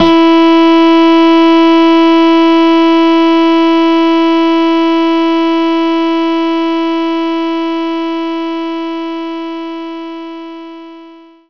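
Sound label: acoustic guitar E3; knights try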